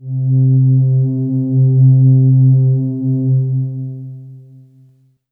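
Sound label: Kawai K11 Warm Pad C3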